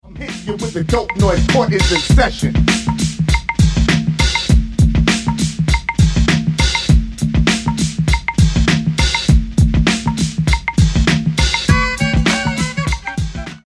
Beat

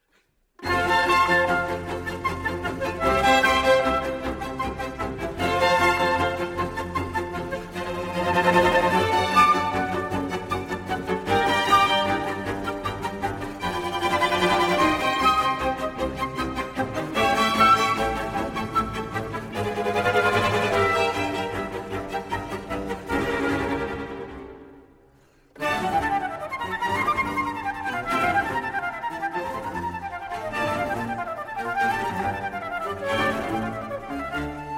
Flute Concerto in G Minor, Presto; Vivaldi; La notte

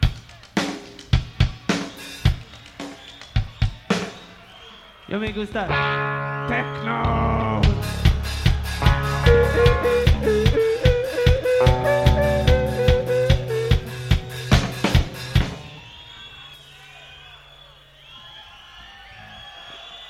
drum jam

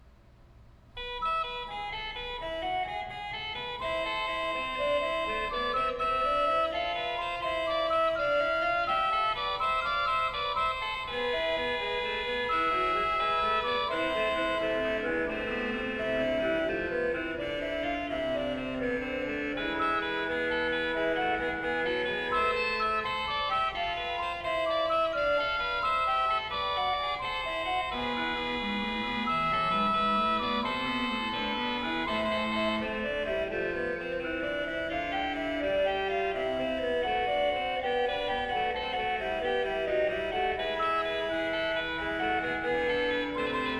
Fugue, Organ

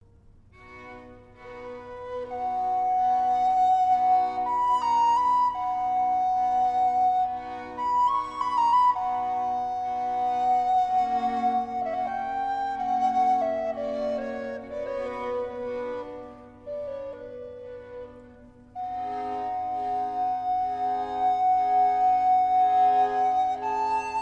Flute Concerto In G Minor, Largo; Op.10, No.2, RV439; La notte; Vivaldi; Catherine Bott

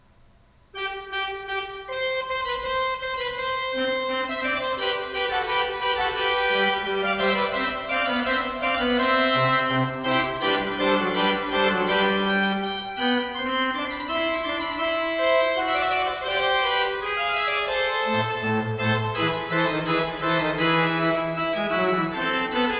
Fugue in C Major, Organ; Johann Pachelbel; Complete Organ Works

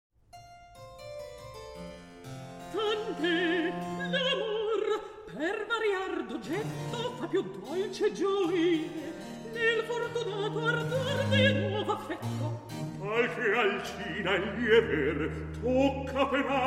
Recitativo; Orlando Furioso, RV 728, Act II, Scene 1; Vivaldi; Jean-christophe Spinosi

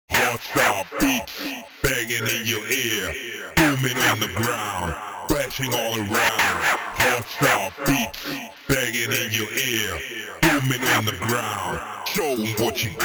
Hardstyle a cappella; 2003

